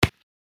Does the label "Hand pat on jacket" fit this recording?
Yes